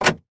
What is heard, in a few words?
van central locking unlock internal iveco; transportation; sound effects; sound effects one